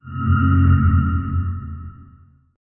connected shadow

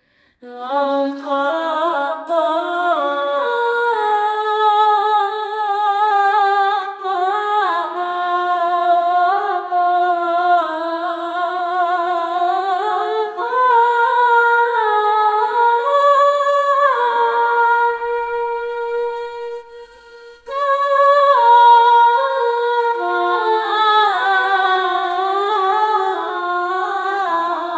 singer songwriter female, acoustic

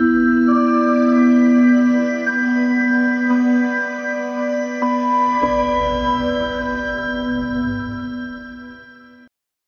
elegant and minimalistic

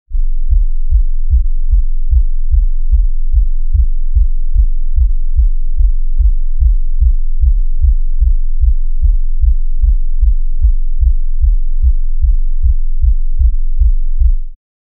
low heartbeat like synth

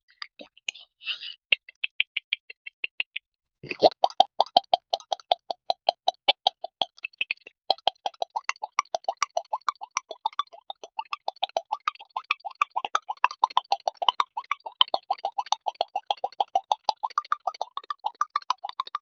a pie spkating in sombody

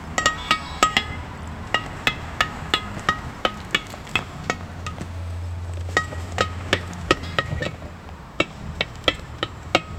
a giant goat stomping, bridge

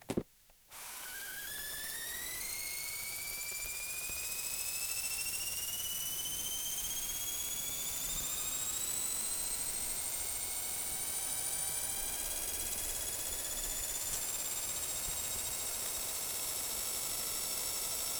camera is flying from a, aerial

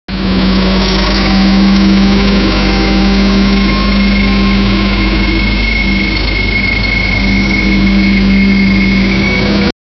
a very loud oil drilling, machinery

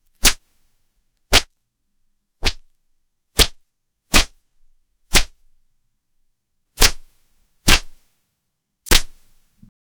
leather clean hyperreal s, snatch

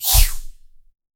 light swish of brush swip

